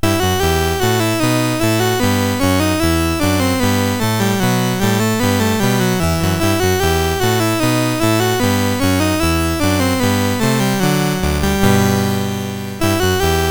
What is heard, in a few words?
Bourree, CSG8580R5